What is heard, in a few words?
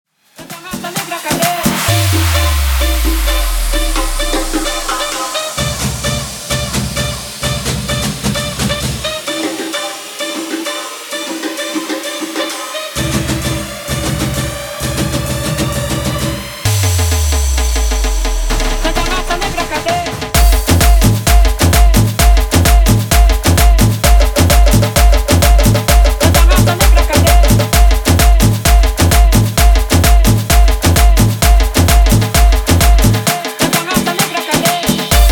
tribal remix 130 bpm